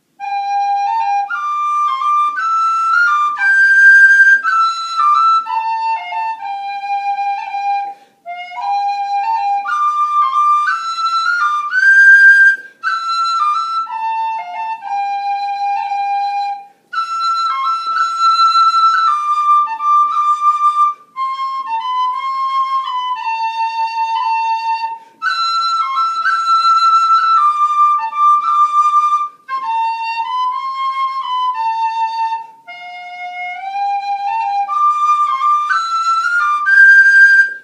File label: WHISTLE